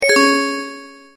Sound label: Notifier Crystal